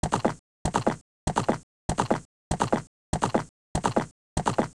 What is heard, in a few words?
Epona Gallop loop; OOT